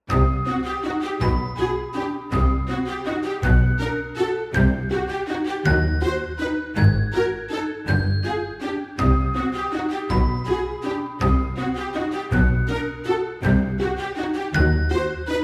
halloween scary spooky background music

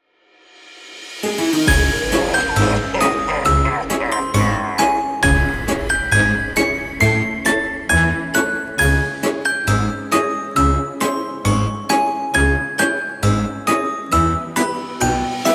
scary halloween nightmares

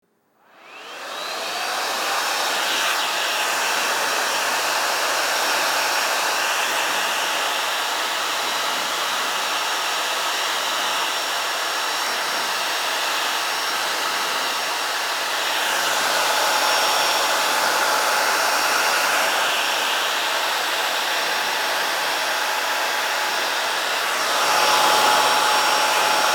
hair dryer version 2

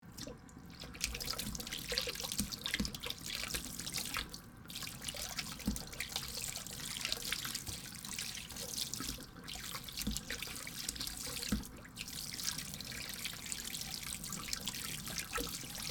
water washing in kitchen

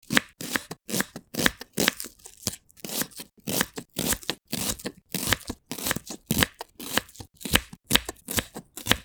cutting onions

